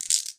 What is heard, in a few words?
item stone; RPG